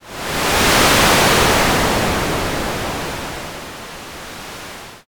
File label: wind